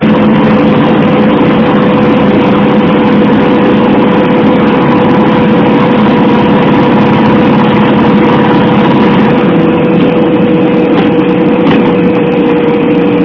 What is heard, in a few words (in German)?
geraeusch gl1200 III